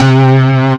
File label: DX 7 mid